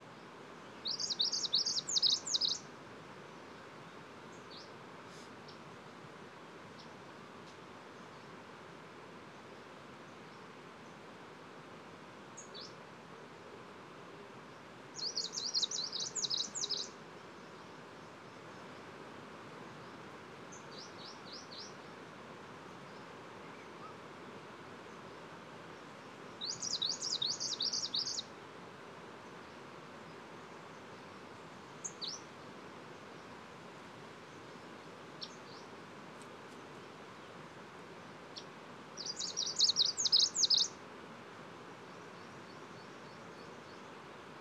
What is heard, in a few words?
ludlowi song